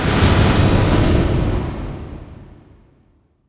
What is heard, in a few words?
Bonus Explode; 7 Wonders of the Ancient World